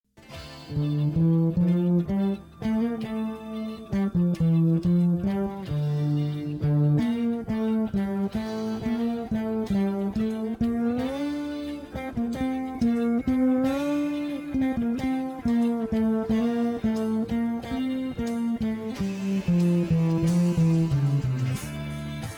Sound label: Bass Track